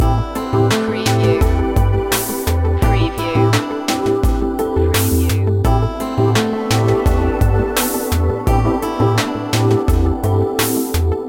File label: soft plug in loop